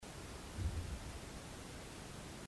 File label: Boiler room thump; no responds; Erebus